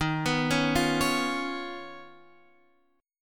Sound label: D sharp 7th Suspended 2nd Sharp 5th D sharp, Guitar, Half Step Down; D#7sus2#5